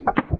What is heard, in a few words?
Mech Crunch; Sid Meier's Civilization 4 Complete; Beyond the Sword; Units